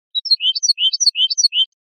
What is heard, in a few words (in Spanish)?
mecida